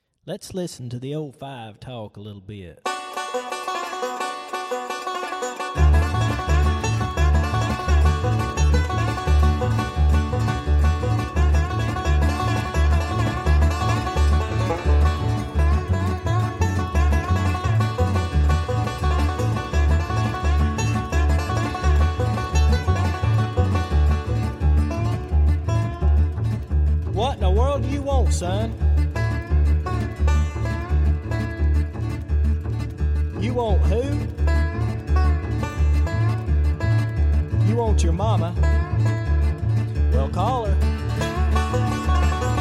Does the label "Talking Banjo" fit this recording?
Yes